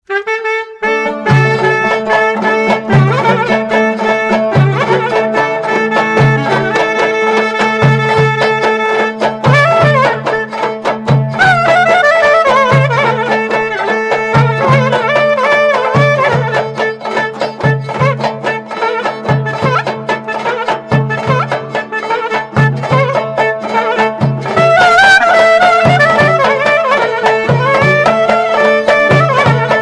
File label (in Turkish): ciftetelli; oynak zil sesleri